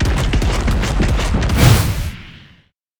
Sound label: Monster; Zenless Zone Zero